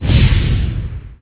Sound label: ROCKET